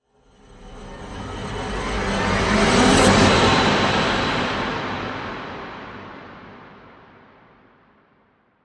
Rev It Surge

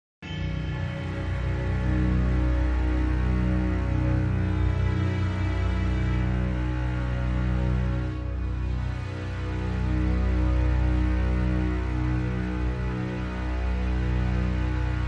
Strings Only

